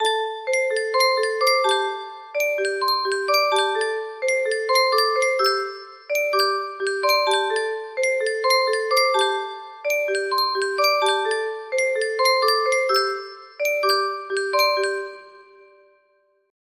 yunsheng tune music box

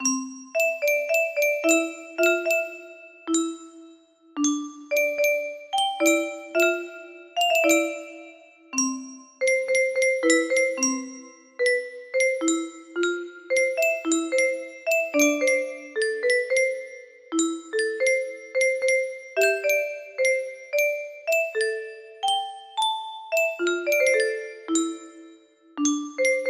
Key of C Kikkerland music box melody

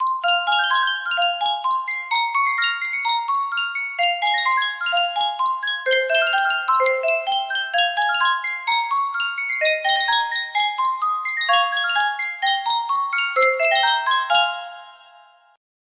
Yunsheng custom tune music box

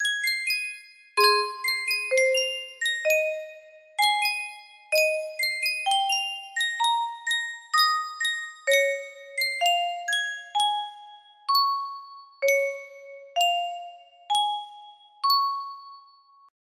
yunsheng music box

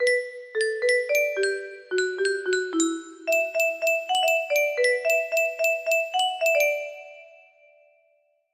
music box melody

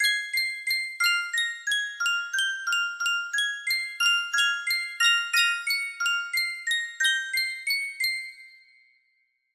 Sankyo, Sankyo Blue Rose music box keychain

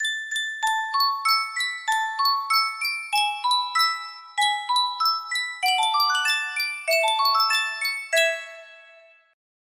sankyo miniature music box

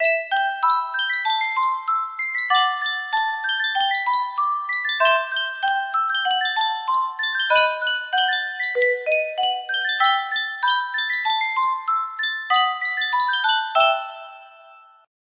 yunsheng custom tune music box

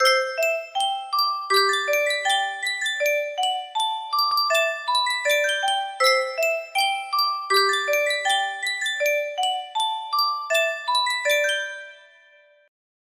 Sankyo music box melody

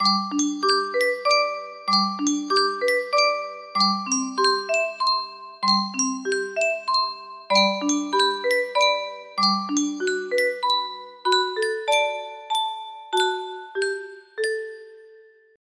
Spieluhr, music box melody

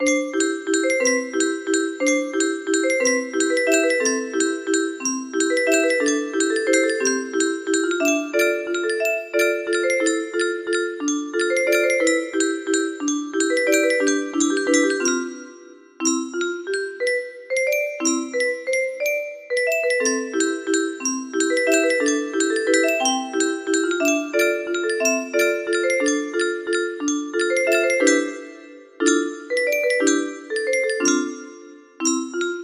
15 note, music box melody

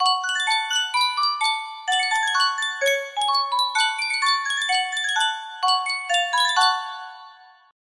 Sankyo miniature music box

